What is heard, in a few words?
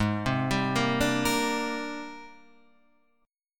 Ab Major Sharp 11th Ab, Guitar Open F; AbM#11; 8,7,7,5,5,5; arpeggiated; chordshape; JGuitar